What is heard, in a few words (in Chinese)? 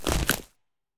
嘎枝解冻声